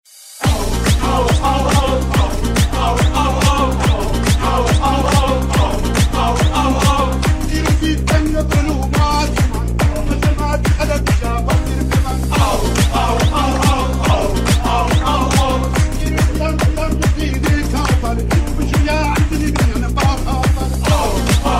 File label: Ringtone